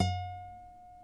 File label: NYL NV G, nylon no vib guitars; Spectrasonics; Hans Zimmer Guitars VOL-1